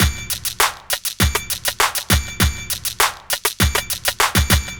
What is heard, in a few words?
drloop, drumloops, Vinyl Attack; Masterbits